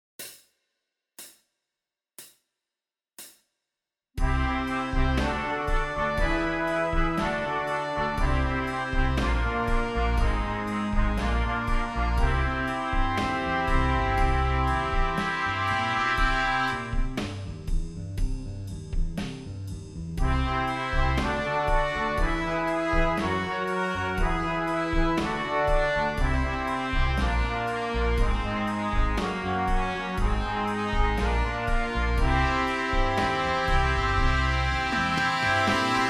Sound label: Intermediate Daily Warm up Horns in Fifths